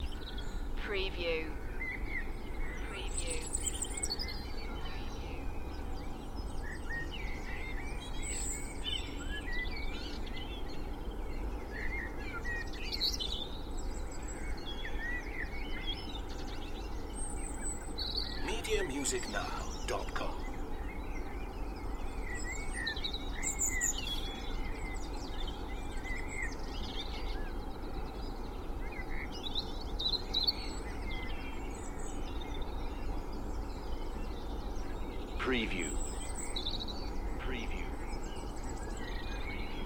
Spring dawn chorus with distant traffic noise Cheshire UK; beanstalk audio